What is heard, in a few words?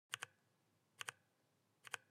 brown switch keystrokes